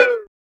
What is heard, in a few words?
Perc